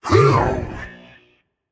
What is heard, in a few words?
grunt attack, voicepack; smite; jorm